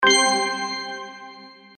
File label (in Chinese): 正确; 音效